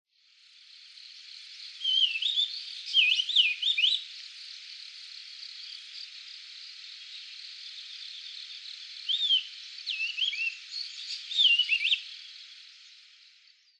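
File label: higegabi, Bearded Reedling; kochi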